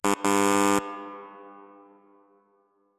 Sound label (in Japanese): リバーブトラック適用